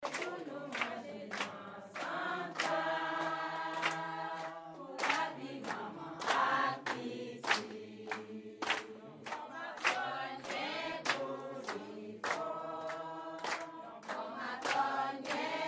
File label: midwives class singing at the tienne dispensary